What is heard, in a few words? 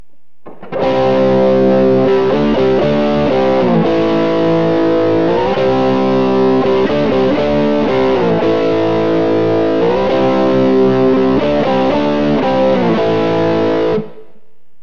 ant neck crunch; burny; fugly clips